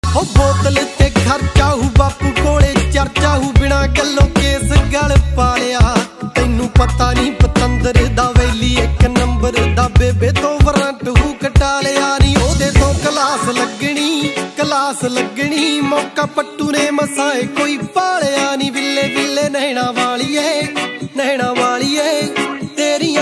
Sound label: Punjabi song